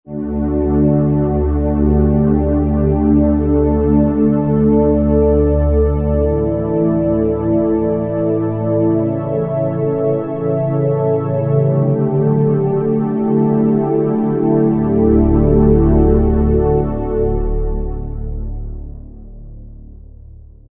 Soft Strings 2, Roland SR-JV80-12 Hip Hop Collection